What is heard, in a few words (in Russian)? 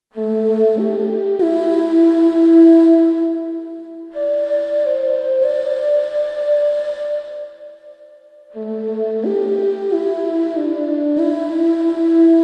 Zvuchanie limbe